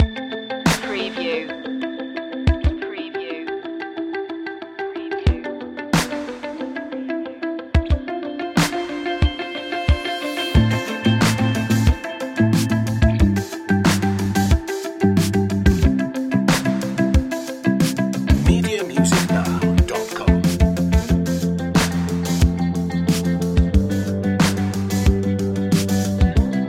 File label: analog epic loop